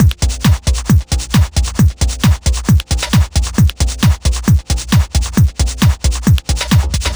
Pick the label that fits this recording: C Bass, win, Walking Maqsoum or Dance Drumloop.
Dance Drumloop